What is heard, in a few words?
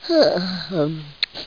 yawn; tanzania